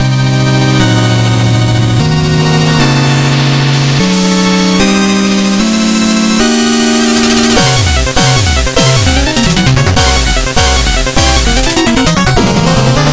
Music Boss; P Boss Giga Plague; Shovel Knight King of Cards

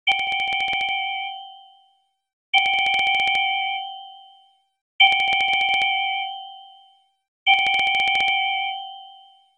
Familiar Telephone Tones なじみの電話の音; Elle; pc-98

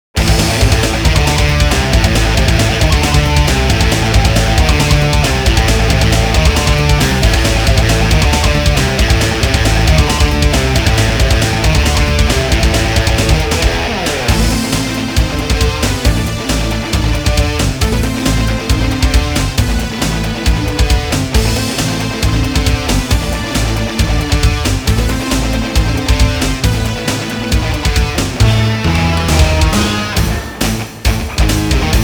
Death Match, pc engine; World Heroes 2; METAL MESH (Death Match 2)

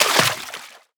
Object Enter Water

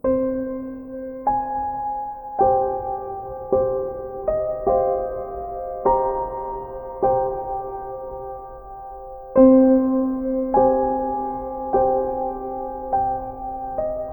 Piano; Scourge Outbreak

